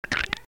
goo death; The Binding of Isaac; SFX Monsters

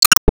Foot Man Hall; Foot Man Hall Wet; Disney Star Smash; Android, iOS, Mobile

